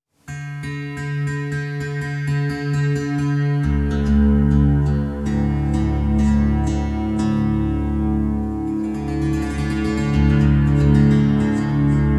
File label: Monolini in D